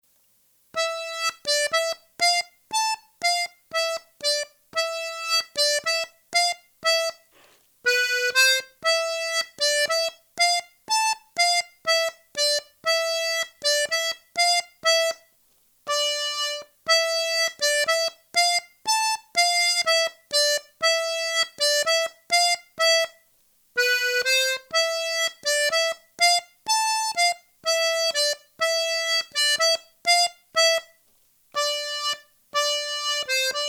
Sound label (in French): Bourree Marrakech; Musique d-ensemble